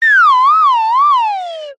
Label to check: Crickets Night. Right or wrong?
Wrong